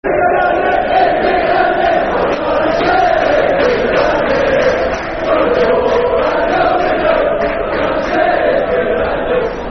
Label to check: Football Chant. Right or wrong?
Right